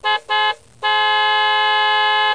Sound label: C HORNS; Interactive Manchester United